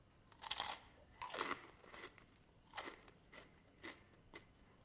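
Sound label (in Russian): поедание хруст снека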